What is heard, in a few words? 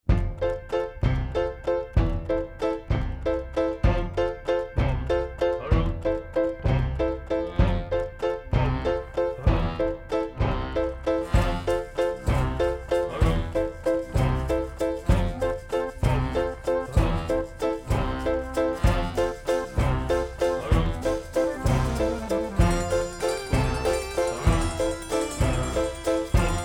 Tea Party Intro Transition; Alice in Wonderland - Tea Party Intro